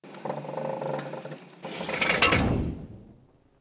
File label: catapult attack; propack